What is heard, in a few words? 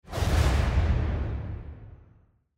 transition